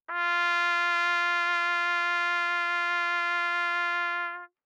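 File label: Trumpet vibrato ff F4 stereo, Bb Trumpet; MIS Pitches - 2014; Brass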